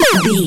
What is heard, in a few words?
retro laser gun shoot